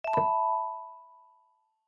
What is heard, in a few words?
Bomb Awake; my cute commissar 2020 gamerip